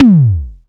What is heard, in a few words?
tom, Tama TS-305